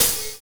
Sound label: Hc SP, Roland MV8800, DRM MV-1200